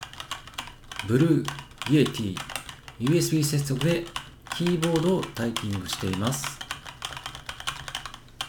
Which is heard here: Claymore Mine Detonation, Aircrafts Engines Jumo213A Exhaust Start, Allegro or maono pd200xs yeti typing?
maono pd200xs yeti typing